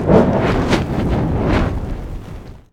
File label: Win Fire Burst; aloha